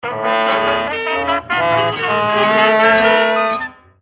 Game Over; Shadows of the Damned; ps3 gamerip 2011

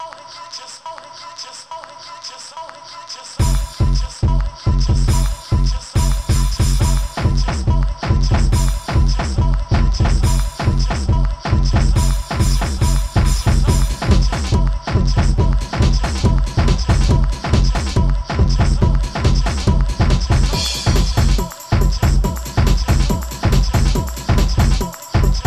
Acid Bomb; beatmania; PS1

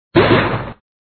Got Item!; Tails Nightmare

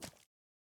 water jump; teardown; ps5 windows xbox series xs

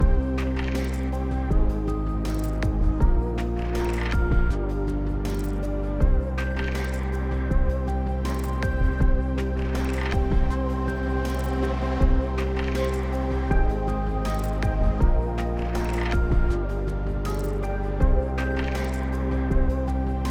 Exploration 8CH 80BPM

